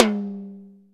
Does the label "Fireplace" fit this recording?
No